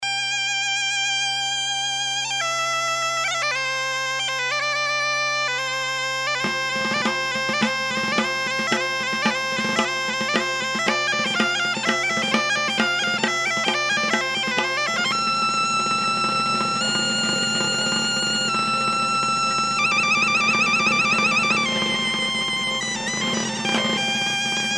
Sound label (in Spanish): gaita